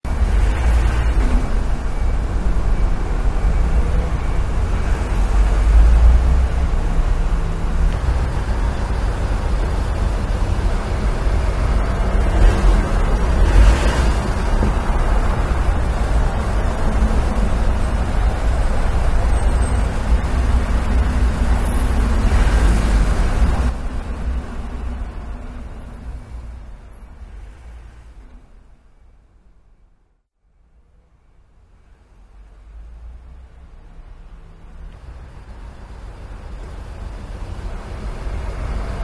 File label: street sound; Sound design for the opera composer: concepts and methods; Vicky and Albert audio cues